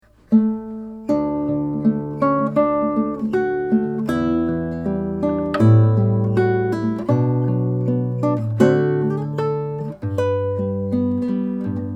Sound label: Auld Lang Syne guitar